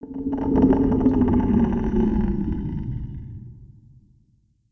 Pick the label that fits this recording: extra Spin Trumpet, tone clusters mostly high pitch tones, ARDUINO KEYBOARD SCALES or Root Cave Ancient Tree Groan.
Root Cave Ancient Tree Groan